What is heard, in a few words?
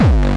bombo, kicks, Hardcore